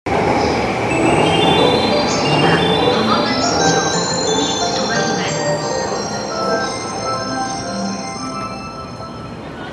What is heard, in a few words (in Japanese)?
京浜東北線東京南, train sounds